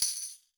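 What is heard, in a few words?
Tambourine Acoustic Classic Lo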